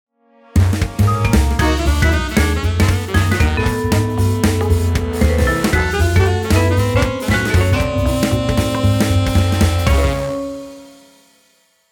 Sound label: Intruder Confirmed; gun point